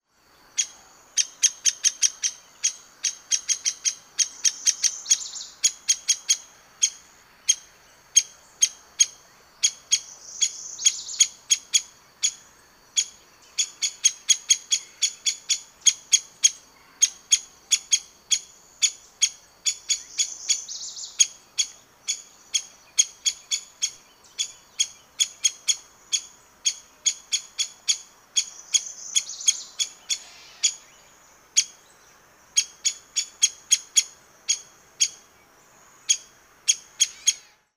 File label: Downy Woodpecker, Dryobates pubescens; woodpeckers